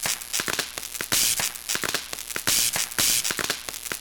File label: sparks; openffa; fadersounds